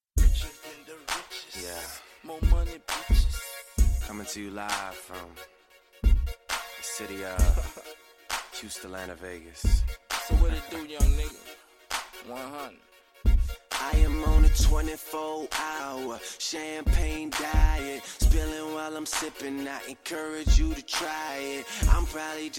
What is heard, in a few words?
low bass